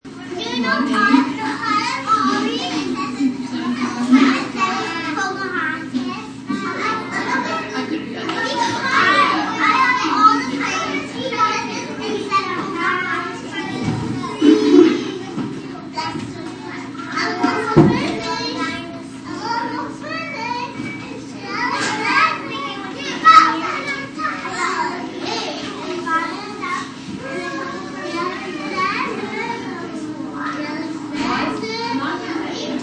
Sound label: Preschool Ambience; Beeping of security door; Schools (Sound Effects:Backgrounds)